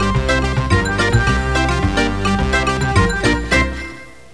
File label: Sound DANCE; My Science Fair